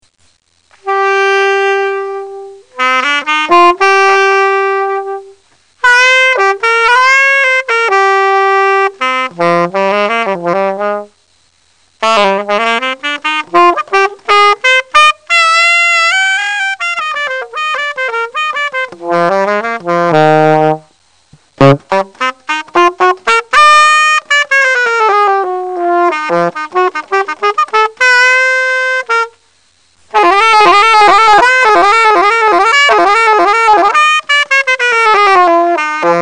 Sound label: Sax Solo